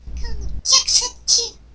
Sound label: attack; shii